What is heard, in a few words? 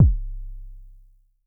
K 808 ish, Vermona Mono15 Drums Kicks